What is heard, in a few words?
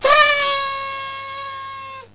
rotate; kinderspiele1; Minispiel mit Sounds und effekten versehen